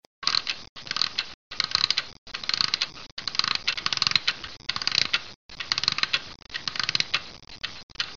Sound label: wind click ringtone